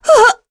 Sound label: Damage; Shamilla; kingsraid